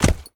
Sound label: pet footstep; smite; lancelot